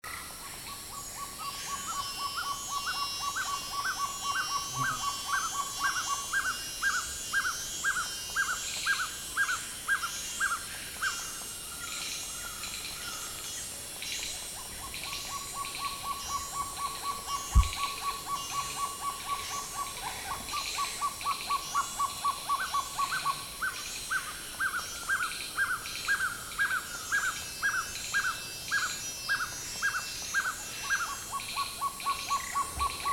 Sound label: Guianan trogon